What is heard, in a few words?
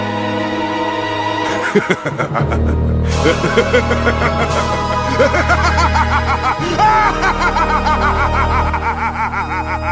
evil laugh; mephiles